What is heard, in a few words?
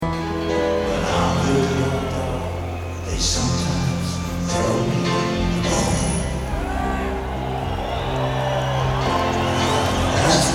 live vocals